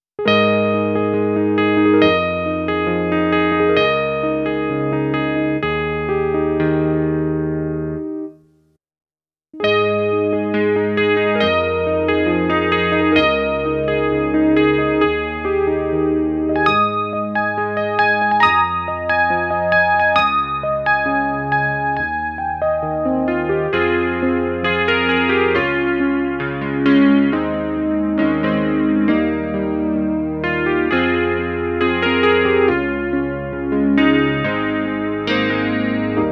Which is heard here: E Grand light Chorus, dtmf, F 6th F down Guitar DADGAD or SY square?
E Grand light Chorus